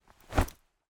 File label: ICE Skater cloth move